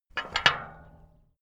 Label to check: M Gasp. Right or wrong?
Wrong